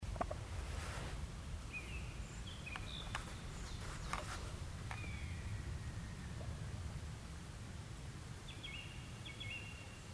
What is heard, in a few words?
pre spring walk biesfeld